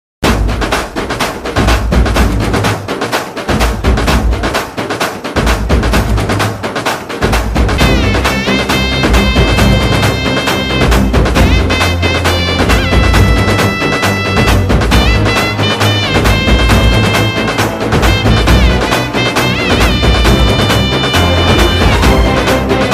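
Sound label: climax background music bass boosted; rangasthalam; ramcharan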